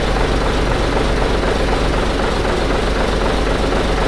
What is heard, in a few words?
v8 idle loop; ratmobile; vehicles